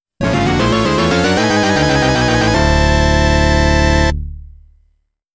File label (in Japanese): Fanfare ～ ファンファーレ (ステージクリア); Yuzo Koshiro Early Collection Box